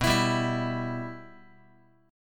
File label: B Suspended 4th Sharp 5th down Guitar; Bsus4#5 strummed; JGuitar